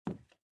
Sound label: run wood